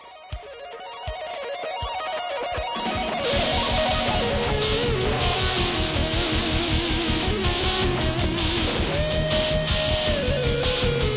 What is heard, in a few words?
sint heavy